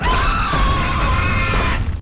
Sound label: Chicken scream